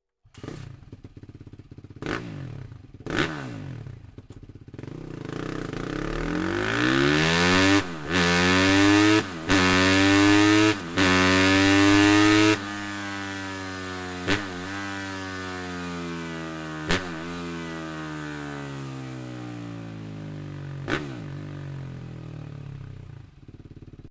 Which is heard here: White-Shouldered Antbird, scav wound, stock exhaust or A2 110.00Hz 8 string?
stock exhaust